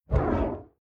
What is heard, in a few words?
Whoosh Fireball Whip Impact Flame Heavy Doppler; SFX Title Graphics; Whoosh Sound Effects